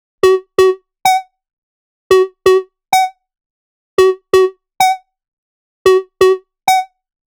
Melody Kits 128BPM Root F#; Vengeance Samples

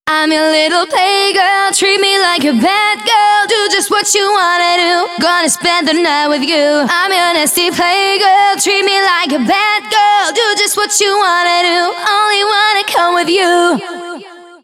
Female Vocals WET, 140 BPM; Vengeance Samples; Vocal-Hook Kits; Song Kit; Play Girl

